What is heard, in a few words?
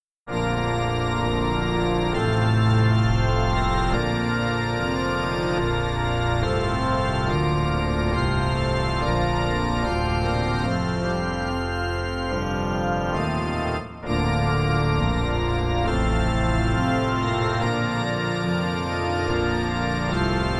TRUMPET VOLUNTARY